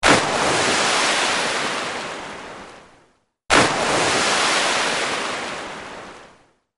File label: Water Splash Alert